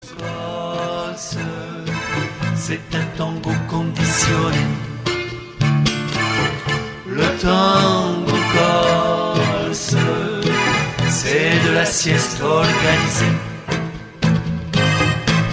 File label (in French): LE TANGO CORSE